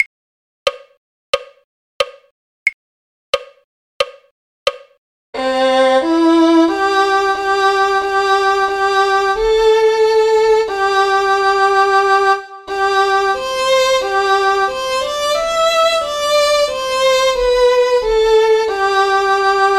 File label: violin; carols